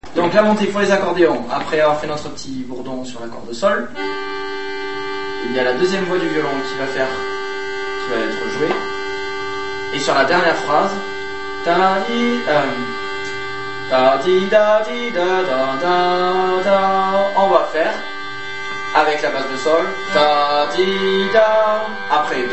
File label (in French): fin 2eme phrase accords MG; Solelh